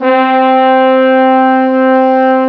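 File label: French horn; Dark Forces Demo; Dark Forces Instruments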